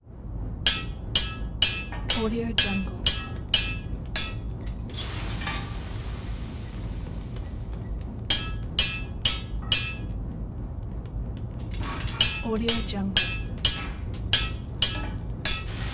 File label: blacksmith workspace ambience